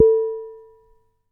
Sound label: Damped Hit, Glass, Large Wine Glass